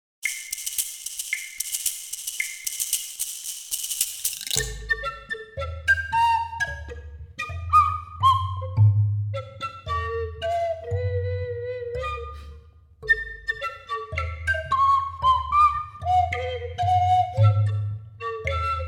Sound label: manual stomp